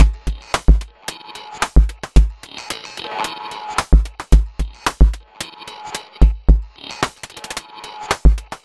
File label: beat